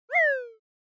Motion Pop

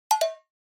Percussive Accent Fast; 打楽器アクセント

